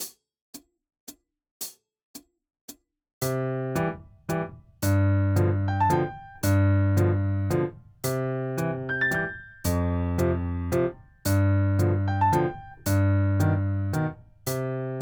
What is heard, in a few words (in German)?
4 Toene Begl.